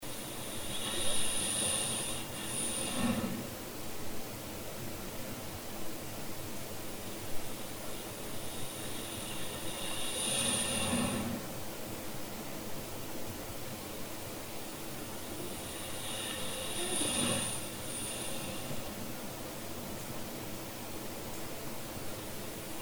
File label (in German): Schnarchen